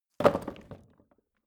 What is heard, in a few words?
wood drop indoors